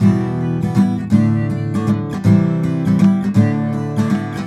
EM GTR, Celtic Flavours

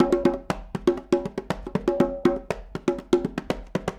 Congas, 70s Analog Funk; Sonic Foundry (Sony Creative Software); What it Is! 70s Analog Funk

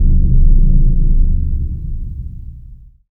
BIG BD, DRUMS; Spectrasonics; Heart of Africa

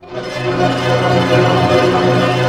ARP C DIM PP, STR ARP; Sound On Sound; Peter Siedleczek's Orchestra

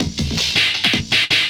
Loop, Drum & Bass, 160 BPM

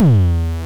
FM Kicks Distortion E2; Drums; LookIMadeAThing Kicks - Vermona Kick Lancet

